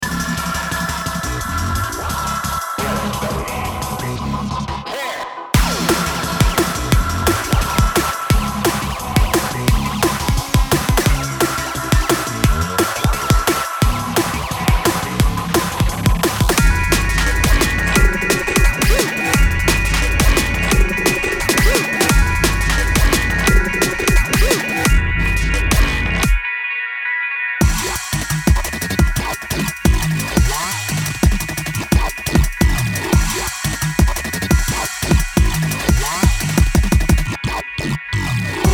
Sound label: techstep